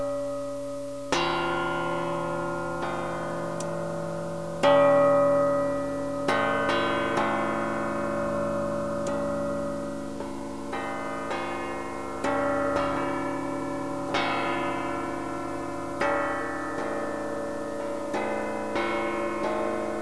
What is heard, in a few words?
gong; seagifts